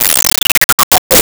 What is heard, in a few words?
Arcade Chirp Descend; Arcade and Games